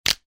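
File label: sounds of a snapped neck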